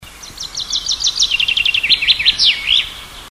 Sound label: bird original